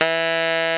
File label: TENOR MF E 3, Saxophones; Greytsounds; Philip Wolfe David Crozier's Saxophones